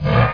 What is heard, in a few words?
step